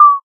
Warning; Space Wave Race

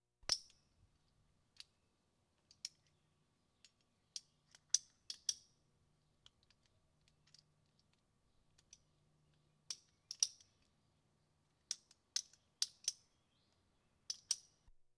Police, Fire engines

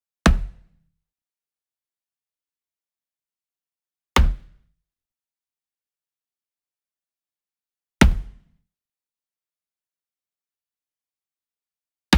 Sweetener Wood Mallet Corner Hit Near; boom library; Cinematic Strikes Construction Kit

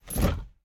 driver foley wheel turn; Blind Drive

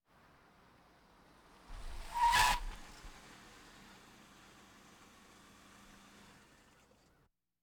Automobiles various

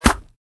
colt hit; firefight

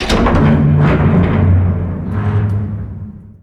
Hatch Large Metal Open; Doors

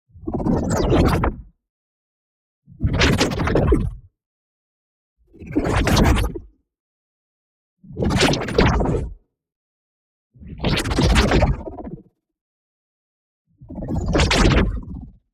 ABILITY CHARGE Ripping Through; boom library; Matter

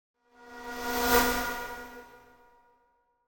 Static Buzz By Thin Buzz, Creepy Pass; Hollywood Edge; sound designer's toolkit v2

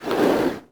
Window Slide Close; SFX Kit; Foley - Misc

